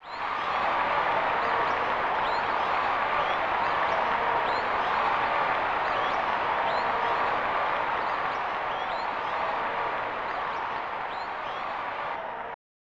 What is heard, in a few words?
Crowd Cheering; ABC Monday Night Football; SNES